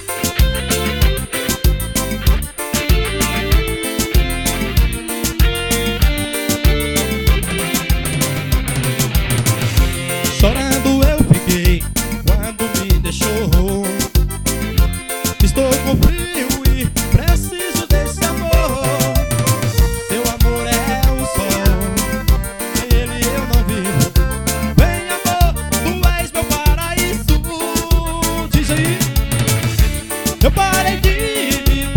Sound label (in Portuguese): forro